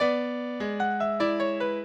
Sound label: minuet, mozart